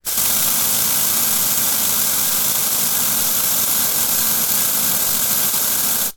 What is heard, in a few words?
household aerosol can spray long; sound effects one